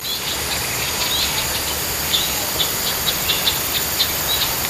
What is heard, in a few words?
Rhinoceros Hornbill adult female or juvenile calls, Taiping Perak Malaysia